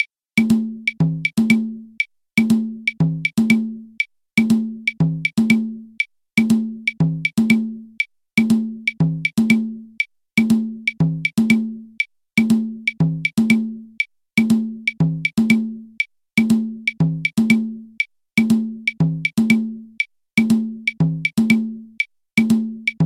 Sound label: mozambique conga